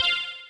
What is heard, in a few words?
ui choose; the-lost-memory